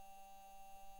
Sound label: mic, mars2020 edlcam